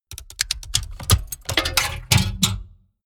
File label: mechanics medium movement; industrie wirtschaft; mechanik